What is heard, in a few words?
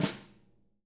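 snare flam, JZ XSNARES; Sampleheads; Peter Erskine Living Drums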